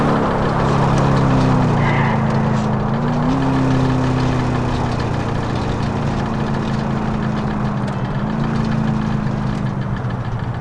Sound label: throttle off fast speed, vehicles; emperor